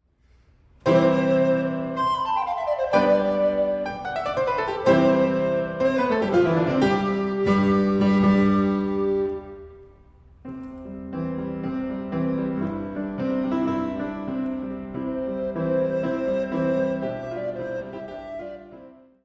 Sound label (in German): Sonate C-Dur